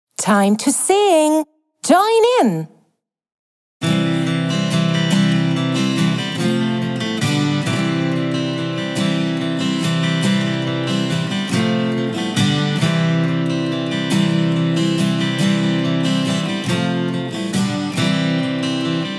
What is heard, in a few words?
song colours in nature karaoke; kindergartenservice; ich und du; Schuelerzeitschriften